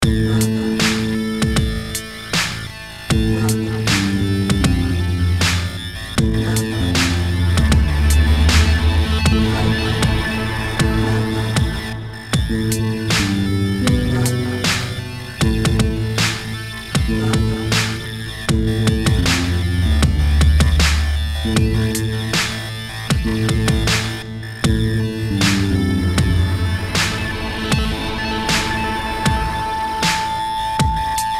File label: Udon Noodles (78 BPM Construction Kit)